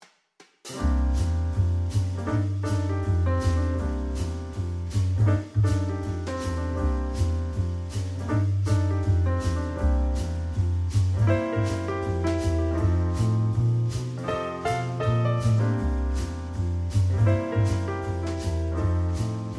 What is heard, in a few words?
bgm wait